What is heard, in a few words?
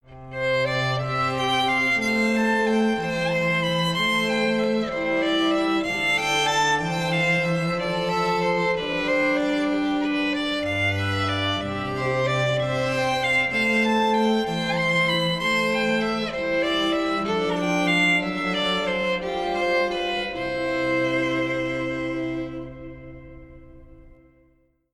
Strings Trio